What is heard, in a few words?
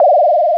bong; yoda; PC Gamer 44